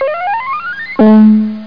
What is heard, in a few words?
Stair Bonk; HyperStudio; Roger Wagner Publishing